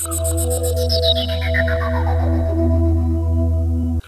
Synth

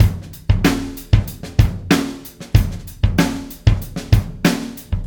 Acoustic Drumloop; Drums & Percussion

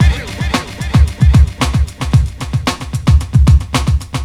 Breakbeat, Drums & Percussion

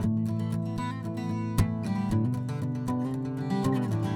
12 String Guitar; Stringed Instruments; Acoustic Guitars

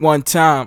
Dry Vocal

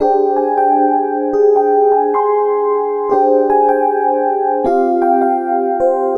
E. Piano

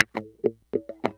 Funk Guitar; Stringed Instruments

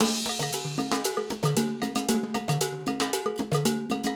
Perc mix, acoustic percussion loop; Drums & Percussion; Percussion Loops

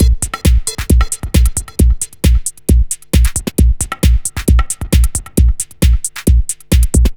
Dance Drumloop

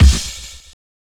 Kick, Drums & Percussion One Shots Drums

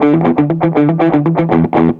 Distorted Guitar; Stringed Instruments

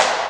Snare; Drums & Percussion; One Shots Drums

